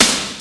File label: SD, CD DRUMS; 90 sSampleCDs; Crypton - DrumBase5000